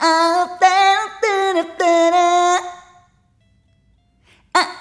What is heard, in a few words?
Rhythmic A